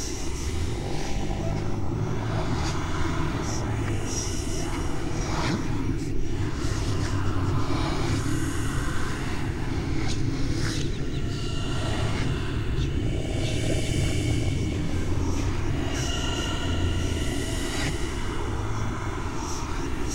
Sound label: ghostmode loop; halloween